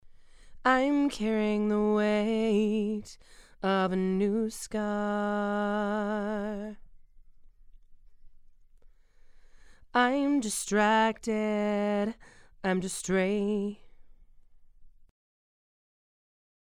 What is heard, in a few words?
Female Vocals